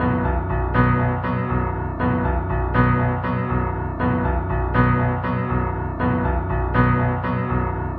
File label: Low Piano; Sonic Foundry (Sony Creative Software); Club Joints and Street Anthems; Drop Top